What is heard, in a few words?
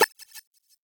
Snake Game Move; Warframe; Nineteeen Ninety Nine